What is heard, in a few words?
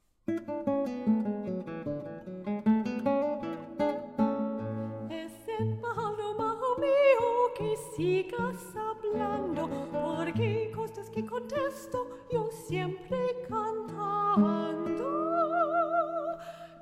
tralala